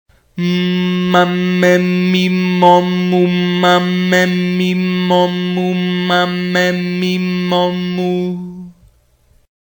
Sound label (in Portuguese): Aquecimento projecao da voz, ressonancia